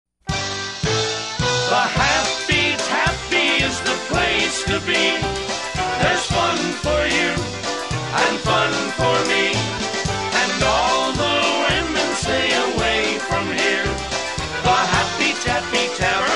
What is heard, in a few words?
Polka